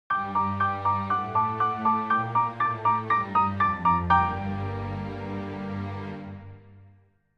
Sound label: piano